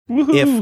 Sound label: woohoo